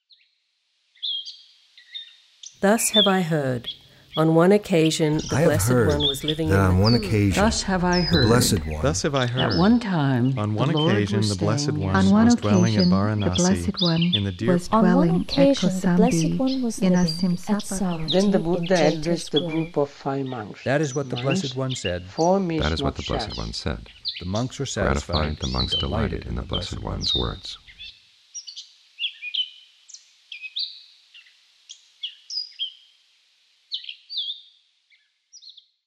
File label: fugue